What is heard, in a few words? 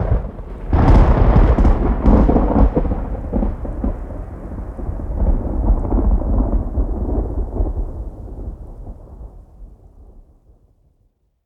Distant Strike